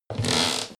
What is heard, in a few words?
creaking floorboard